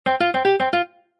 Casino Electronic Sound New Game, Slot Machine: Electronic melodic sound; Casino, gambling